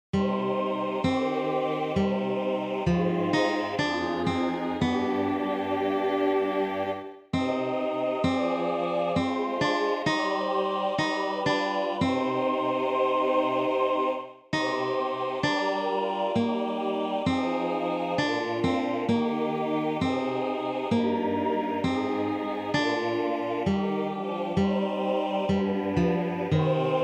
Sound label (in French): ps 31 ténor